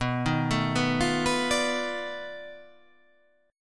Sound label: B 7th Sharp 9th Flat 5th, down